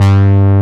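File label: horn G1, synthesizer analog horn; AKAI S6000 CD-ROM - Volume 1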